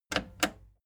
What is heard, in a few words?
plastic button slack click slow